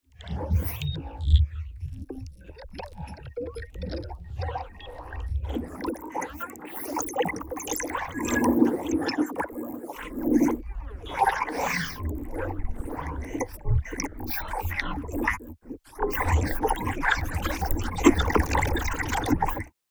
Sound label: granular underwater, sound design; base pack